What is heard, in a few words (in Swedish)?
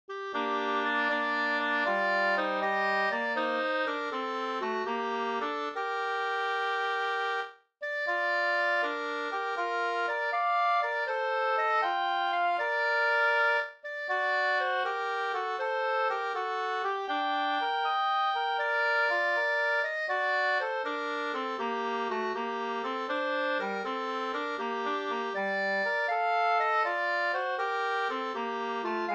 vismelodi; Minns du vad du lofte mig